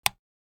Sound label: switch